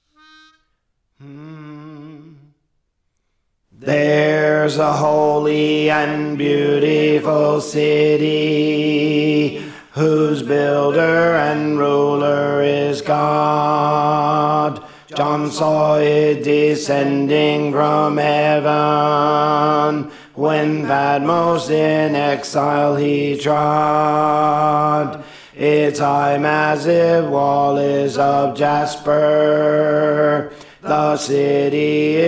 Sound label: Alto; Theres a Holy and Beautiful City; Praise For The Lord Training